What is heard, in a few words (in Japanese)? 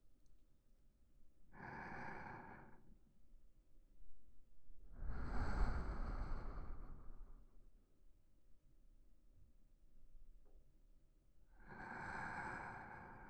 寝息×クチュクチュ×げっぷ; 右耳